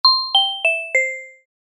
場面切り替え ベル 遅め

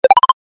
決定 中音 レトロ; モノラル

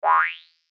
ピョイン

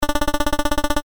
会話

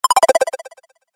キャンセル 中音 レトロ

